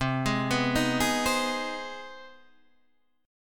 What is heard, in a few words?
C Minor Major 7th Sharp 5th, chord; arpeggiated; JGuitar